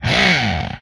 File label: Attack, Vocal; retro arcade